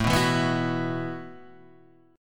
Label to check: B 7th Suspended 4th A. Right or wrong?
Right